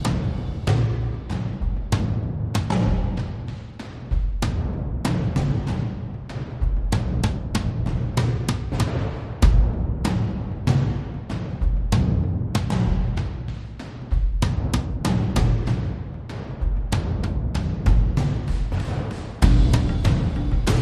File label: Suspenseful War Drums